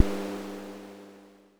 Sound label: FX; MPC DSM-2